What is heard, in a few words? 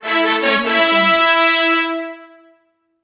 the win trumpet